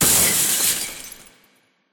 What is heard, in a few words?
Claw hand case shatter reverb